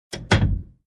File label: Wooden door close bang